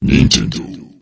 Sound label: logo; nintendo; GAMECUBE; LUIGISMANSION